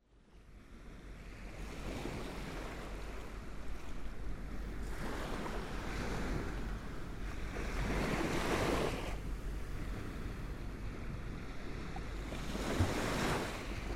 sandy beach strong waves

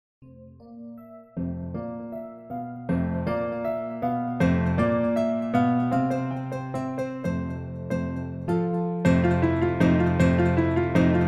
Waltz 4 Relax in Elevators

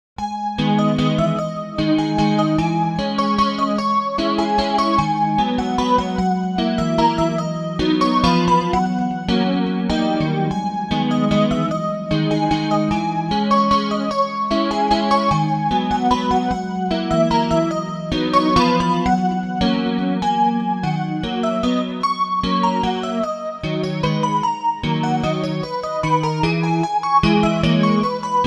slow e-guitar waltz

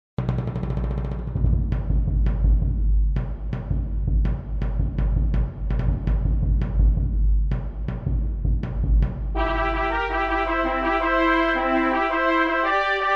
Midsummer Fanfare mit Trommeln; Midsummer Fanfare reprendre et finir à roulement de tambour (variation de Mendelssohn; 0:33

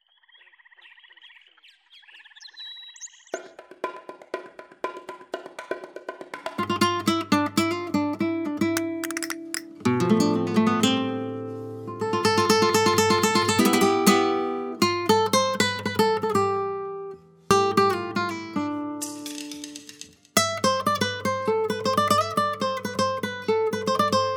Flamenco Ambiento

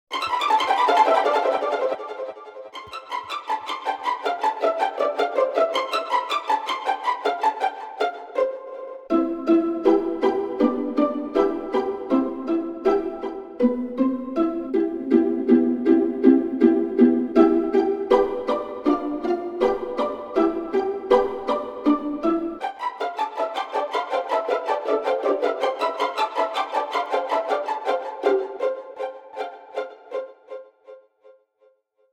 Violin pizzicato abwaerts

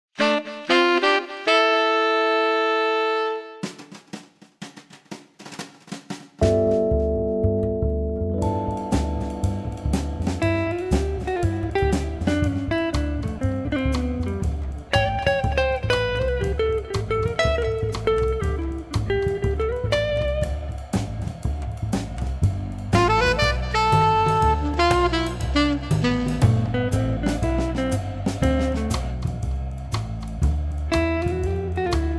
swinging Mood; swinging Mood 4 Light2Art

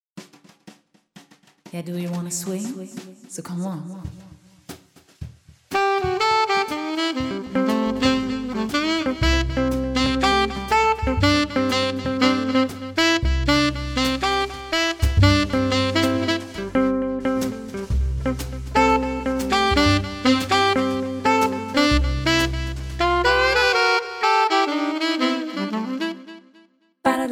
Short Stop Swinger Sax

